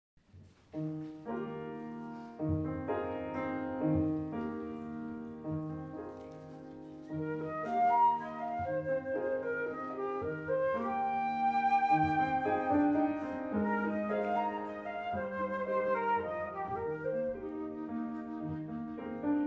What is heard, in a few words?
flute